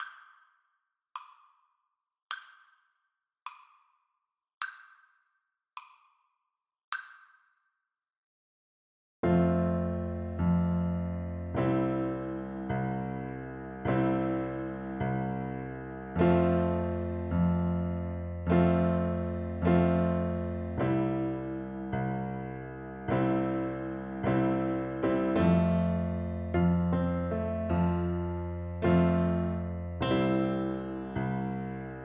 chamarita FL kar, Flute

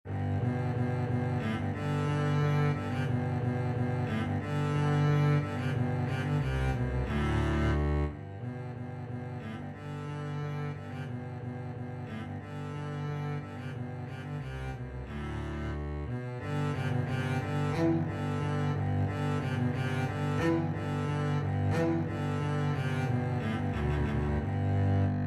double bass duet

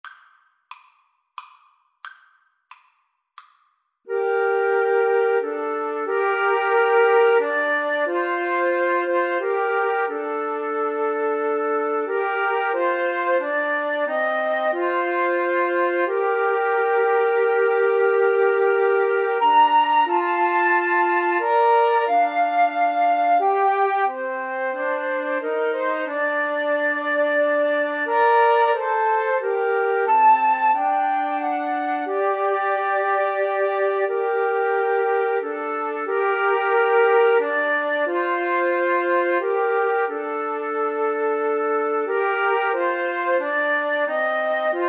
coventry, Flute Trio

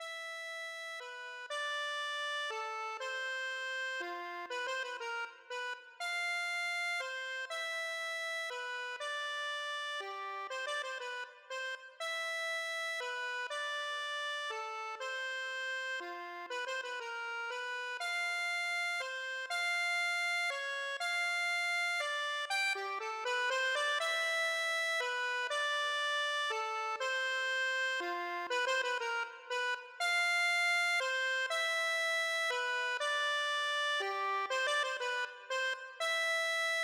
waltz, accordion; tchaikovsky flower

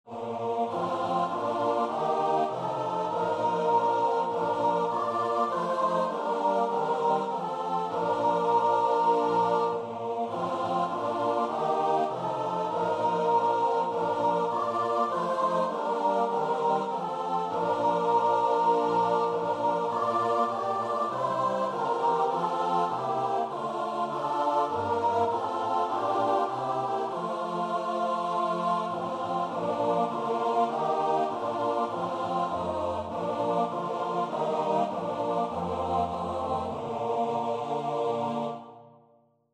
all glory laud, choir

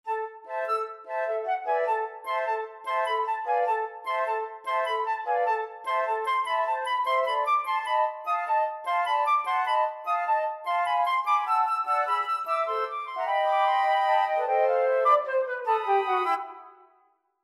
flute quartet

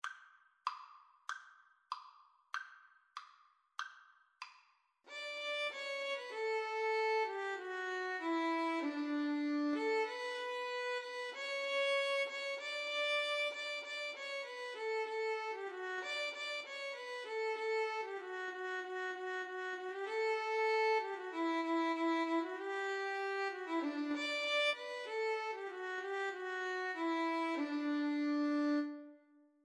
Violin Viola Duet

